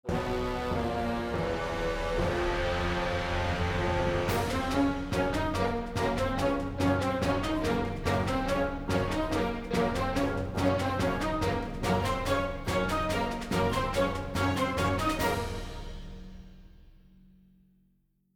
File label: Extinguisher; PAPER MARIO Color Splash; wiiu